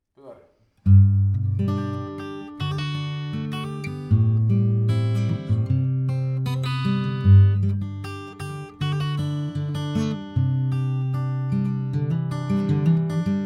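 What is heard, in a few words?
guitar soft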